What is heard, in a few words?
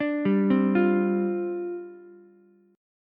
C Suspended 2nd Suspended 4th, down, Ukulele; Csus2sus4 arpeggiated; JGuitar